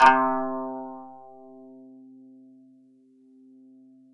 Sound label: KANUN C3; SoundBytes; Early Patches; KANUN SMAL